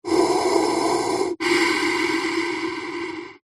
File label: human breathing firefighter mask pov single inhale exhale